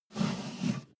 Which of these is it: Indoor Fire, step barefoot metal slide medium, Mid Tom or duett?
step barefoot metal slide medium